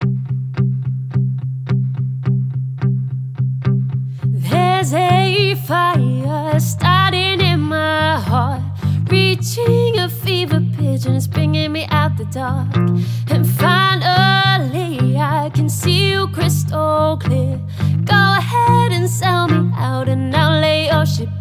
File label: acoustic